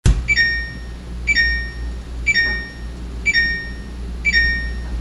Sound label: beeping, samsung refrigerator